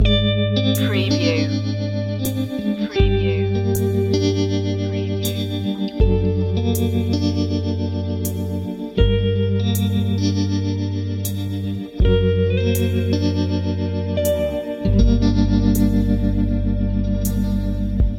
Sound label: spooky guitar pick loop; Soundtrack / Dark / Emotive